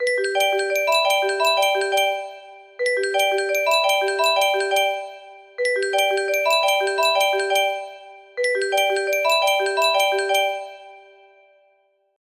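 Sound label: apple ios marimba ringtone, music box melody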